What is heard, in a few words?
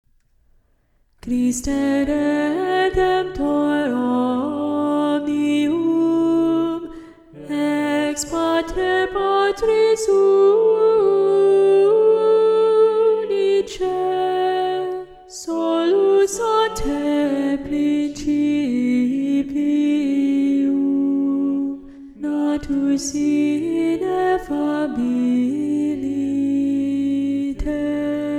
Alto; Christe Redemptor Omnium; Practice Room